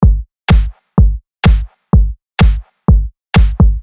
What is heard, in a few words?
Beat Dissected Homework Beat